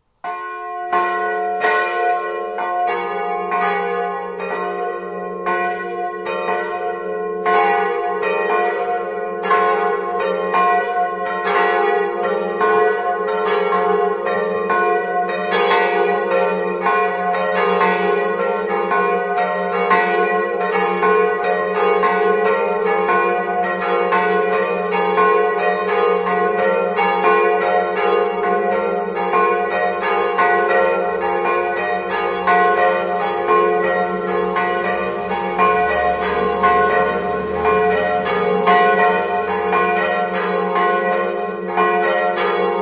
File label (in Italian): sandrat, campane